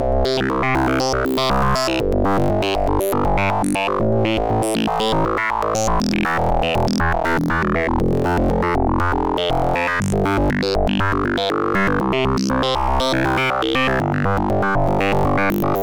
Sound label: nyquist generator